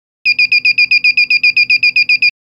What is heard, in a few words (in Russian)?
Отказ по блокировке короткие частые сигналы